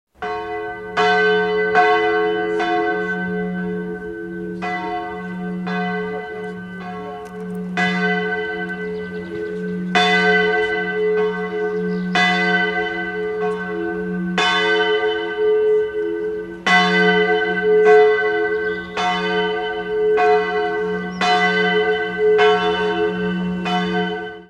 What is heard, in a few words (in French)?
cloches; Germaine